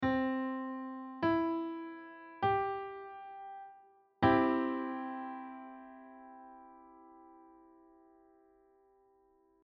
c maj cho

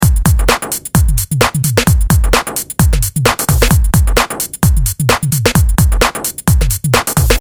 distortion

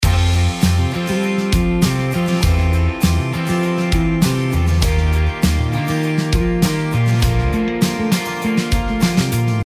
snare attack

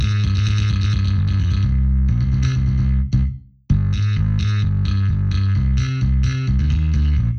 Bass

